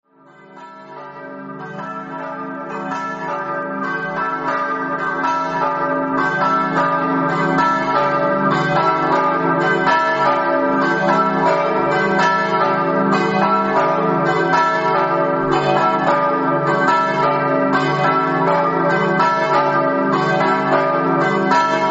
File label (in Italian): campane; sanzuan